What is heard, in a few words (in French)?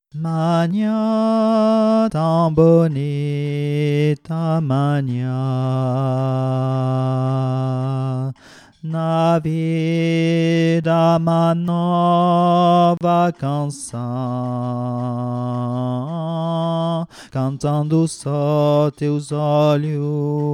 alto VOIX